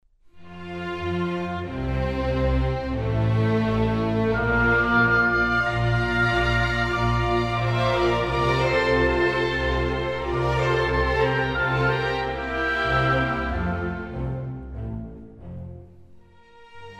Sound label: Adagio non troppo, Oboe Quartet in F major, for oboe, violin and cello; Alexei-Ogrintchouk; W-A-Mozart